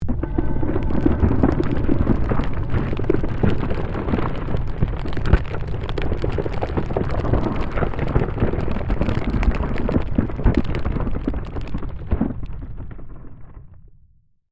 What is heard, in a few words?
Created Magic Spell Glacier Quake